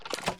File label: Purple Lever On